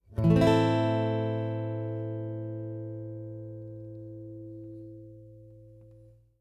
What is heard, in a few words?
A Minor Bar Chord, guitar